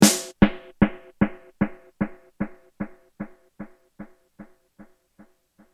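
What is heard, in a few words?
snare ad202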